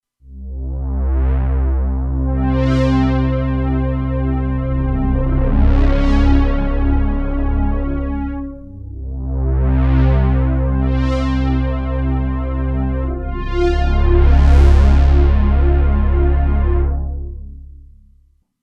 Ominous, Roland Alpha Juno-2; Original Sound Chart patches

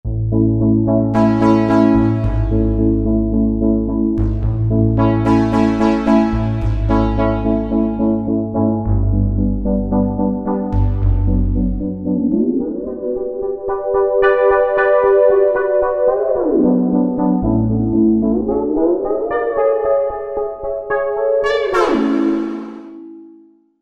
Cottonballs, Roland V-Synth

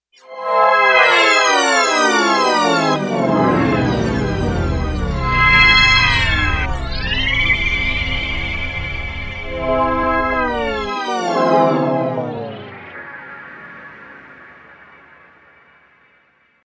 Landing

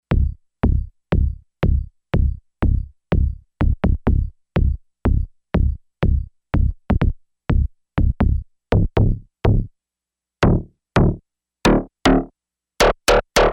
Bass Drum, Yamaha DX11; Factory Preset Voices

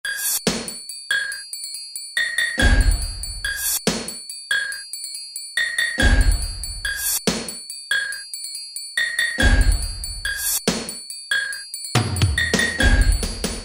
Techno, Alesis SR-16; Preset Patterns

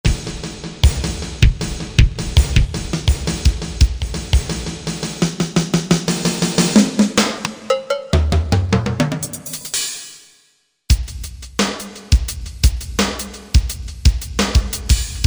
Drums, Percussion; Korg MSC-8S Percussion; Drums&MIDI

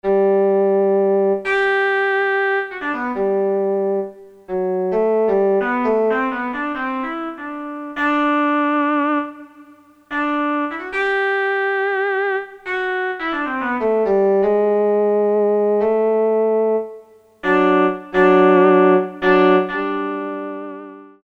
Violas, Korg DSS-1 Strings

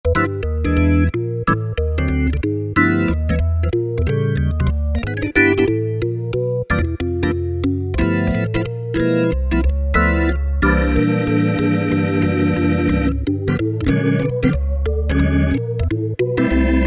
ORGAN - Jazz Organ; Apple GarageBand 2

